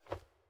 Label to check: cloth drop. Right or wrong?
Right